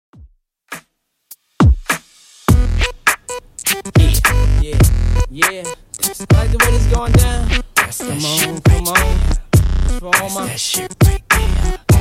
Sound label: Rnb Redrum 102bpm